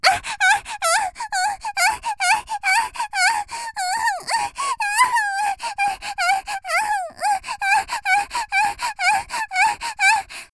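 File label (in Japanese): （少女）「喘ぎ」激しい, 元気な少女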